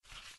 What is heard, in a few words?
corner drag